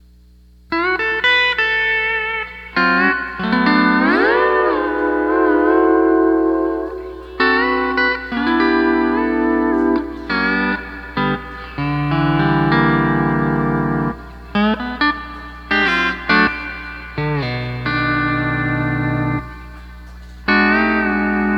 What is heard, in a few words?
Night Life E9th